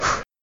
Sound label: Human Blowing